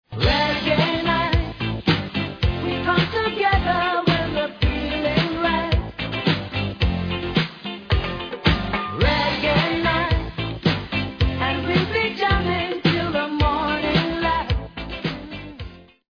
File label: reggae